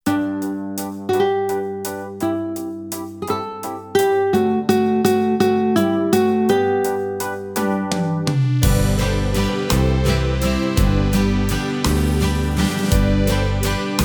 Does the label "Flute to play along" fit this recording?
Yes